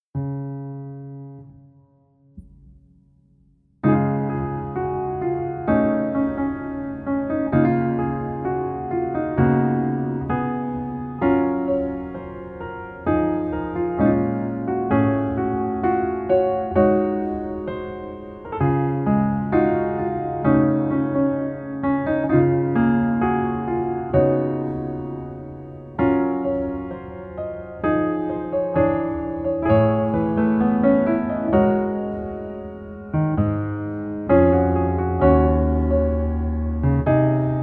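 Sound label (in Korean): Piano version 멜로디 MR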